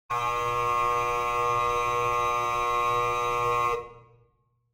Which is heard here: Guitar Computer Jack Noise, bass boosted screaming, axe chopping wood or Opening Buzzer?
Opening Buzzer